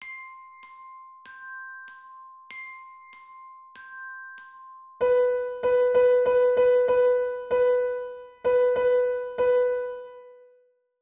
Rhythm 4 4

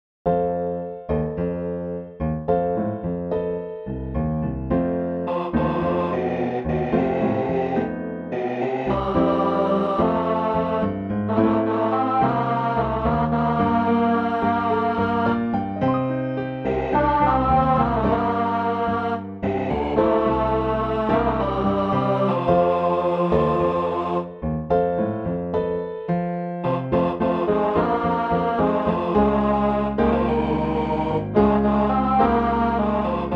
ten bar w vox

